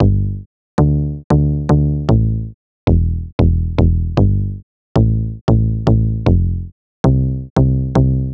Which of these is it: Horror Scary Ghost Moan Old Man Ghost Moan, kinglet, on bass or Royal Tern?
on bass